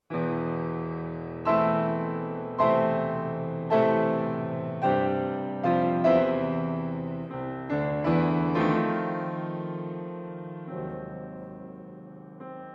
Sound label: Oboe & Piano